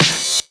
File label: Snares; Vengeance Samples